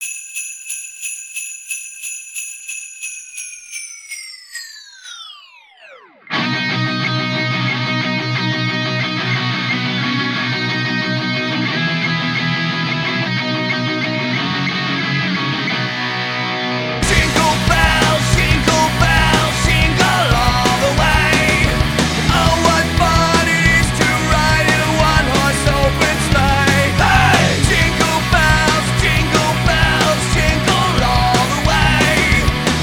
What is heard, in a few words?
jingle bells